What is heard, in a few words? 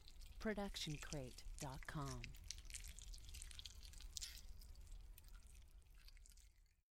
stylized squish; soundscrate